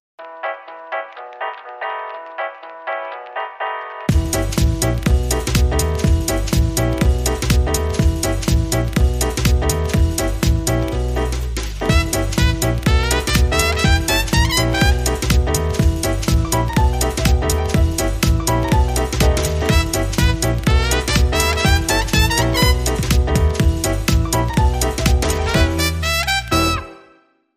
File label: Aahhs And Brass